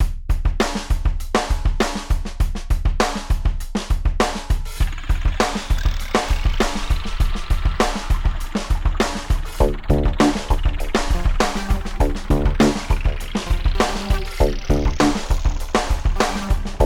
Morning Routine

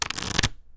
Shuffling Cards

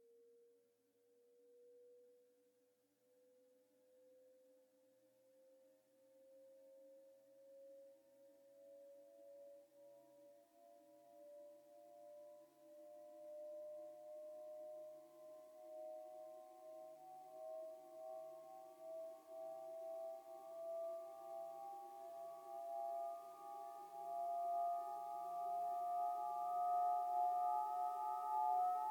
Glass Rising Build Up With Reverb

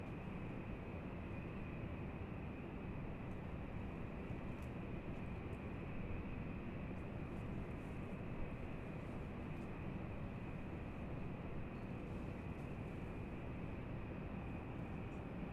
City Far Away

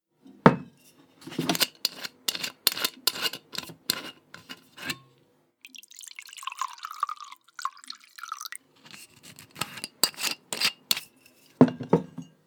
Pouring Whisky

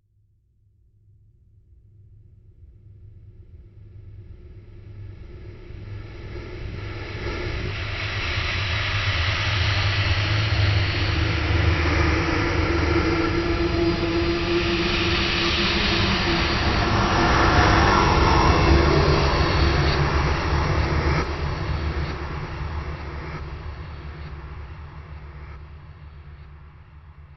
Eerie Swell

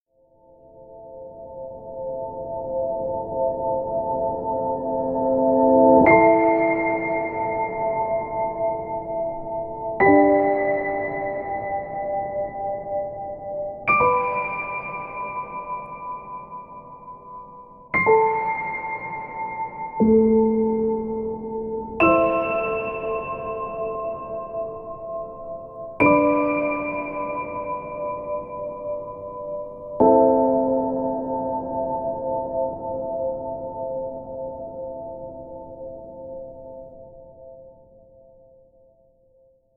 Cinematic Piano Trailer Score Piano Only Version

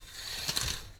Shower Curtain

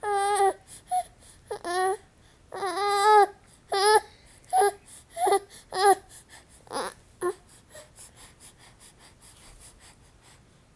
Crybaby

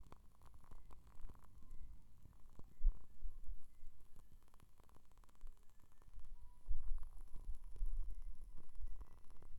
Candle

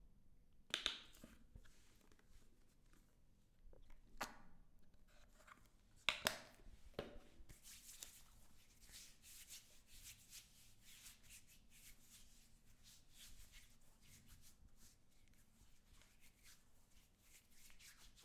Lotion

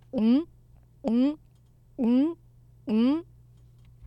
Gulps

